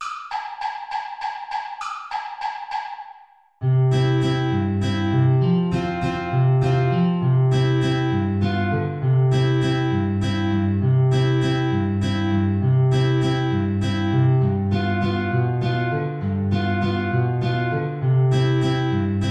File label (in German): Trompete in Bb & Gitarre, C-Dur, Play-along